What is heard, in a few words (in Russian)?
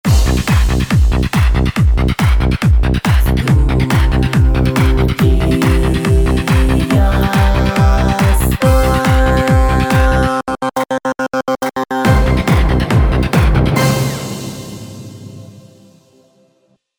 Fanfary